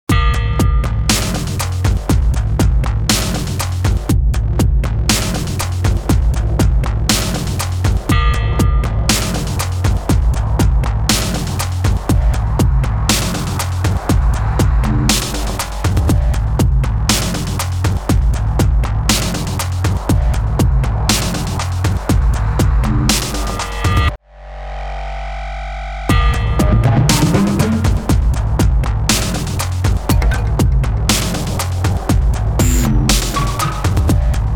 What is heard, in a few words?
beat